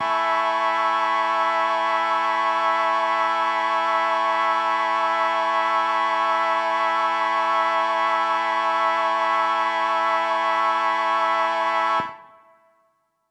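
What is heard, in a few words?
Mixed Winds A major 7, synth orchestra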